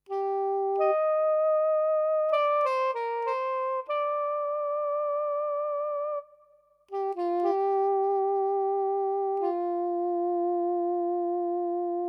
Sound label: MX49 Saxophones